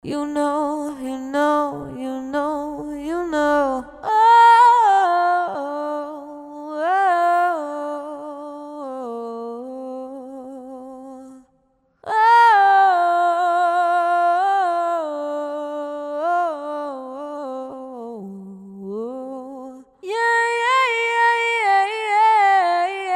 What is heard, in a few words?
Smooth Vocal Hooks